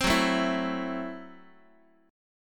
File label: Gb Minor 7th Flat 5th Gb Guitar; strummed; x,x,3,4,1,3; 4A,4E,5A,5D,5G#,6C#; JGuitar